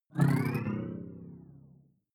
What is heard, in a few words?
sci-fi alien big bionics up; swooshes-swishes